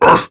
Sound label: Pain Prime Grineer Male; Tau; Battle Barks